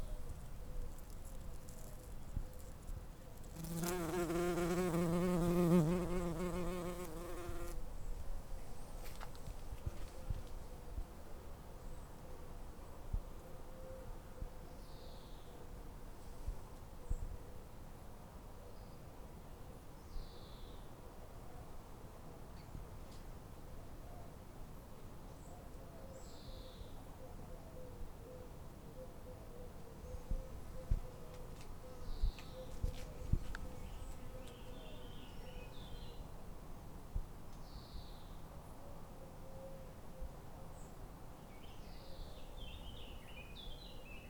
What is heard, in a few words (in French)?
Bourdon Coq, oiseaux